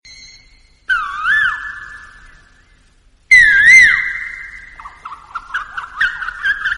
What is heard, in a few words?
Koyal Bird Sound Ringtone; ringtones